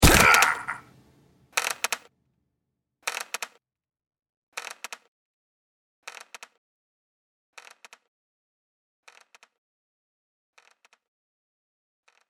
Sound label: Man falls through gallows and swings on a rope; Gagging; Voice; Hanging